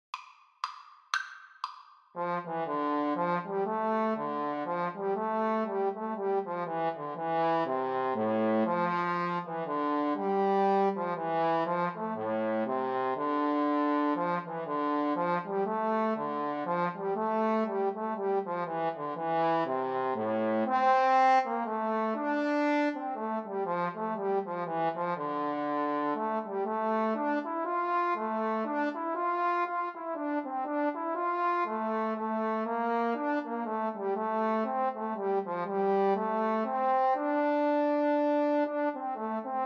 Trombone Duet

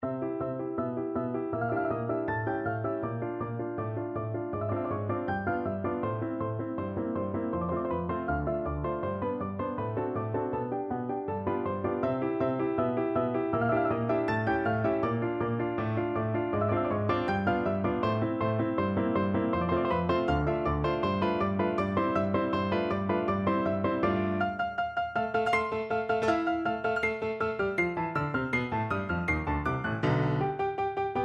carnival finale, piano duet

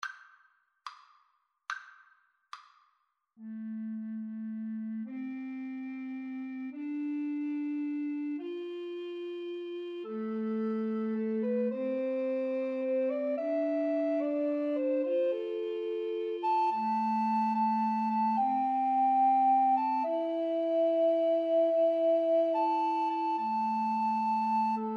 house of the rising sun, Recorder Trio

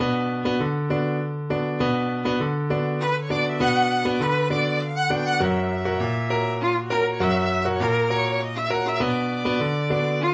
siete de abril violin

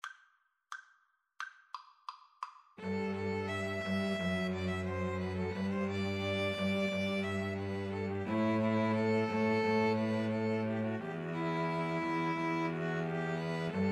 string trio

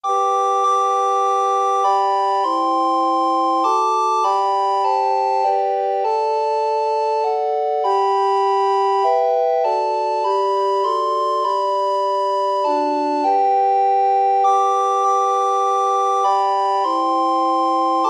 carol, recorder quartet

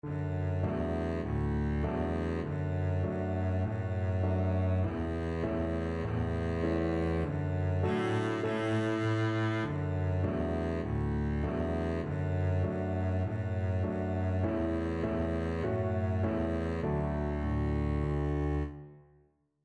mary had a little lamb double bass

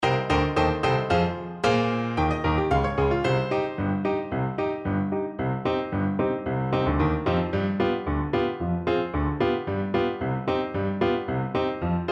horn, French Horn

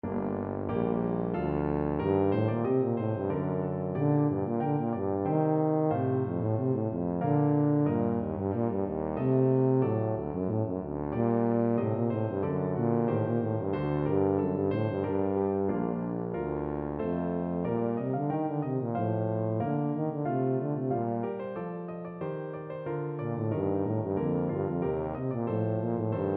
purcell rondeau, tuba

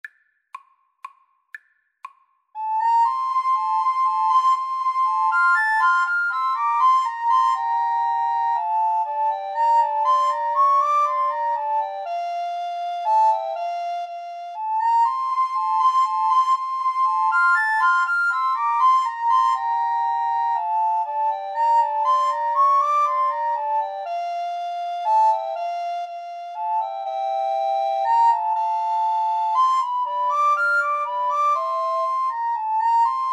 haydn minuet, Recorder Trio

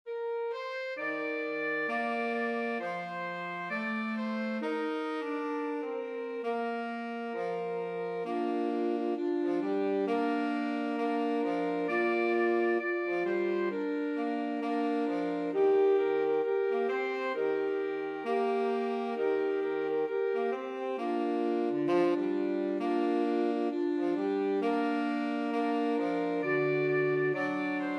2 altos tenor sax

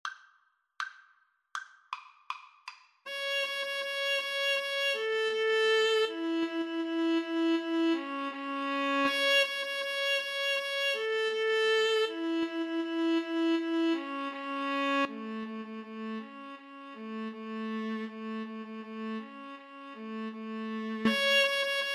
duos marcia, Violin Viola Duet

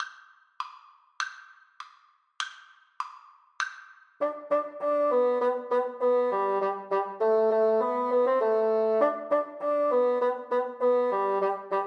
duos allegro, Bassoon Duet

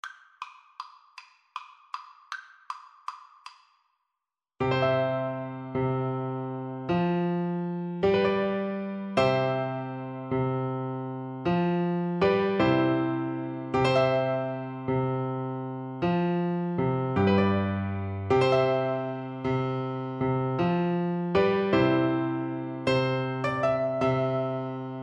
wilsons wilde, Flute

